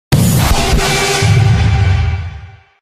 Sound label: Jump Scare; Tattletail All Jumpscares; FNaF Final Hours Soundtracks